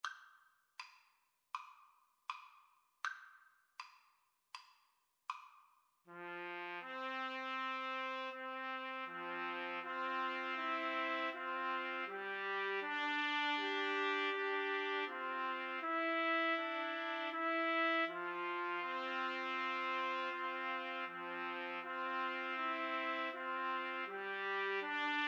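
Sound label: trumpet, karaoke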